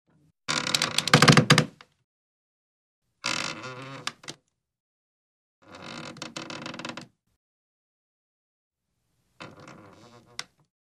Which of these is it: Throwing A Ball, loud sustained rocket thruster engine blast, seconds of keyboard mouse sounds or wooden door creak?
wooden door creak